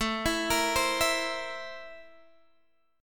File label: C 13th A; arpeggiated